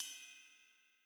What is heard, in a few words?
Ground Easy Hit Small; the legend of zelda tears of the kingdom; Field Battle